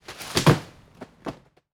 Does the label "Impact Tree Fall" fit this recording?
Yes